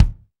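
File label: bass drum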